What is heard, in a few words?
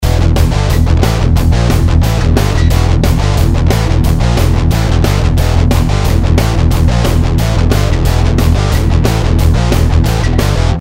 metal